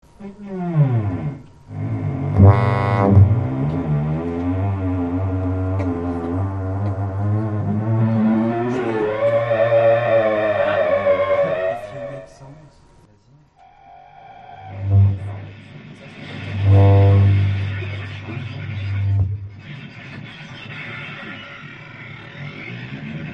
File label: test patches joystick; Noisers & Broyeurs